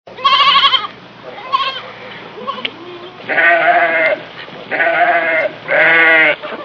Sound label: Gale Goosfand; Animals; Ringtones